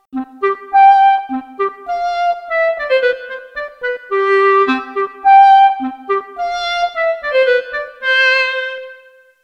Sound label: Classical Clarinet